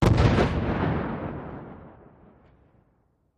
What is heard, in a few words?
1st Car Blow Up 4 Pipe